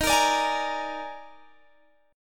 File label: D sharp Major 7th Suspended 4th, D sharp, down; strummed